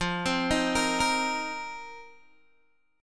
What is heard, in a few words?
F 7th Suspended 4th F down Guitar Open G